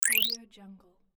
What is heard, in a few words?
rise up